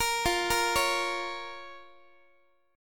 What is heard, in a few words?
F Suspended 4th Sharp 5th F, Guitar; Fsus4#5; arpeggiated